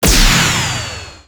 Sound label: Enemy Temple Active Start; Se Enemy Temple Active Start